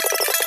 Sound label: TAPE LOOP, Ensoniq ZR-76; 300 Drum Machines